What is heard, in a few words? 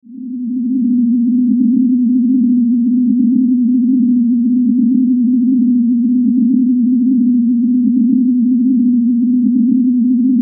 Drone Dark Reverb Feedback Vibrato; Blastwave